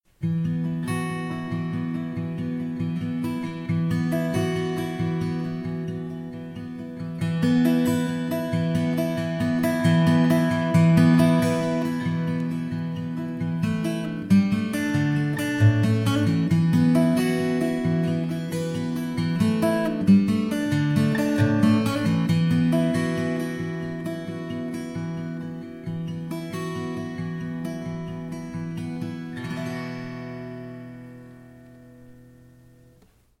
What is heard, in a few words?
Picking Background